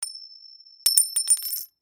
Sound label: Coin Flip Land